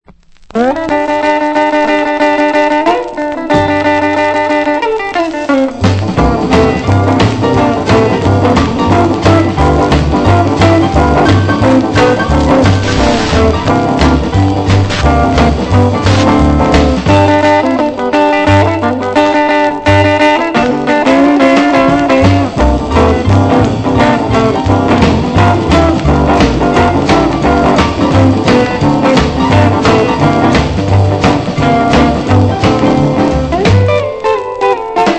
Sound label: GUITAR BOOGIE